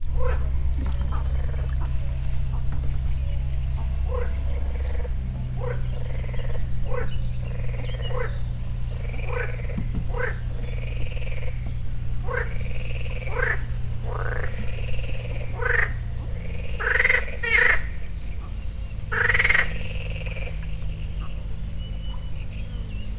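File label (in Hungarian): kettő béka és röcsögő víz csepegtetés; tiszavalk; termeszetben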